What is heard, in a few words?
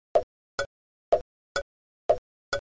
CARS